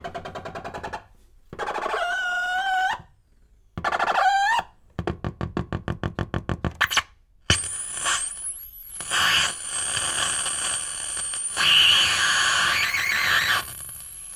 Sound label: sub feedback; infolipo; electromagnetoscope